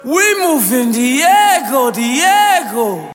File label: Message tone